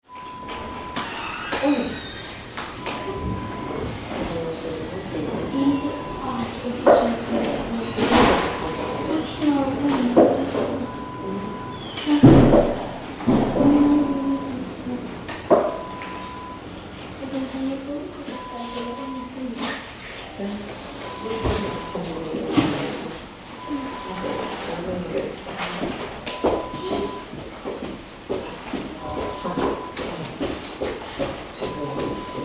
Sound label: Doctors Surgery